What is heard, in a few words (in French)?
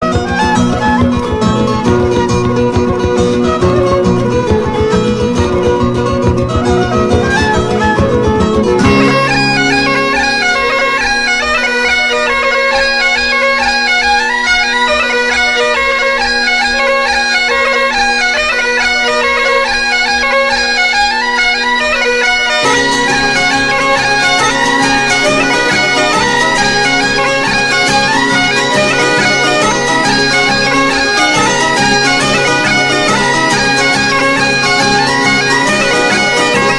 musique traditionnelle ecossaise